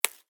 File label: Bonecrack; little robot sound factory